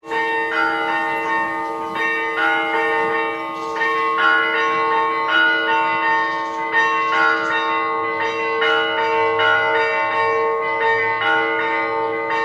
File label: church bells